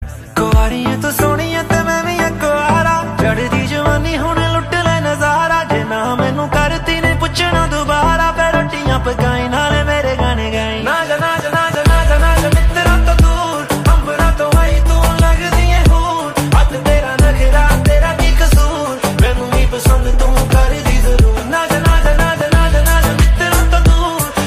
Best punjabi tone

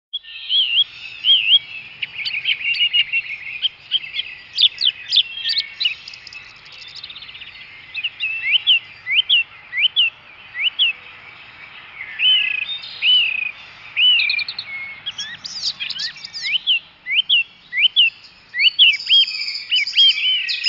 Morning birds

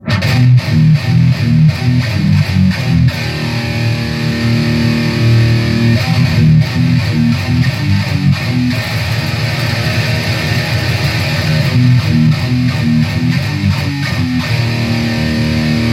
METAL

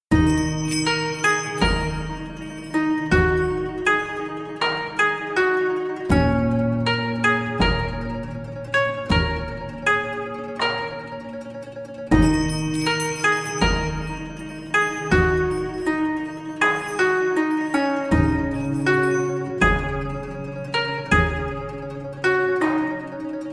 Japanese classix

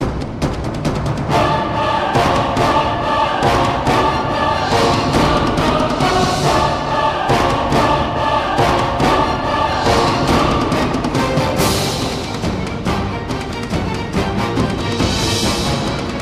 Sirens

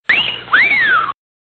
Wolf Whistle

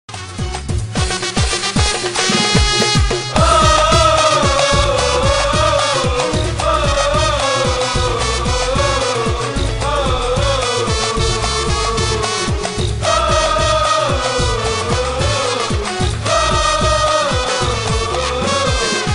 Audience

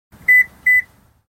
Toyota meep loud

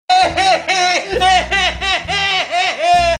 troll cry

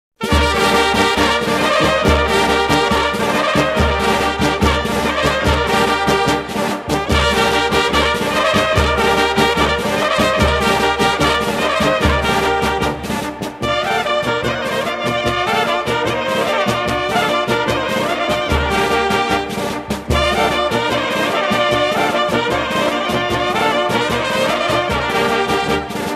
Cocek

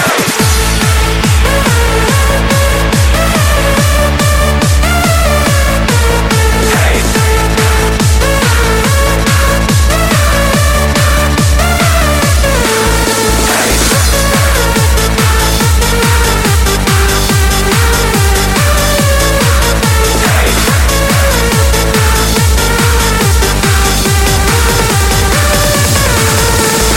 Trance Bass

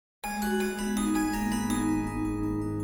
Chimes

